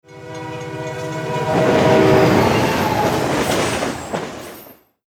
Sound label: Railroad; simcity